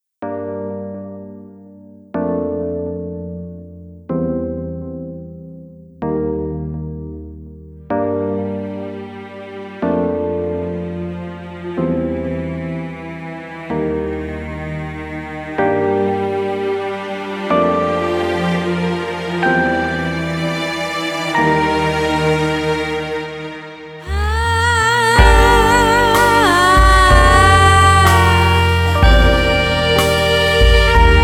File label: Rhodes Chill Out Mix